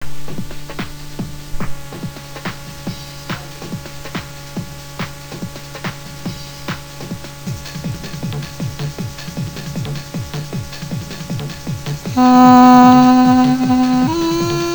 concerto

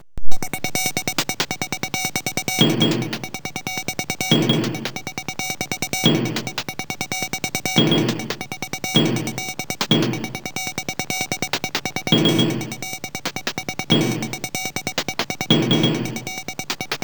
concerto

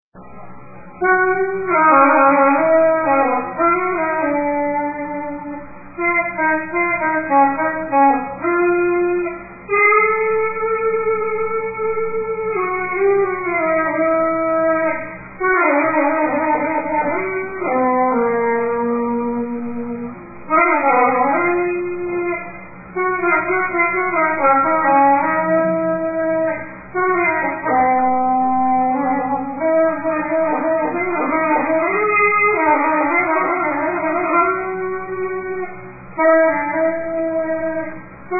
trombone

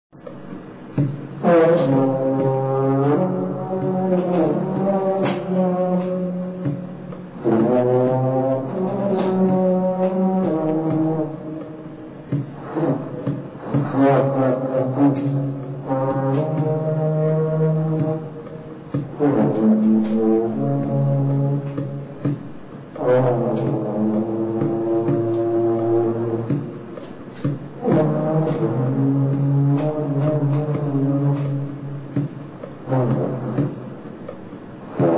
trombone

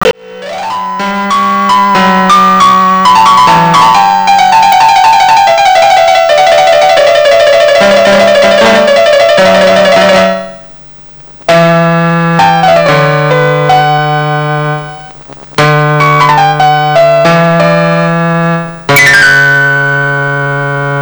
concerto

